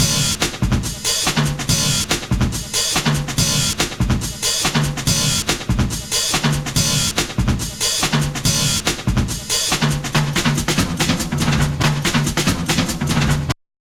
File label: funky fever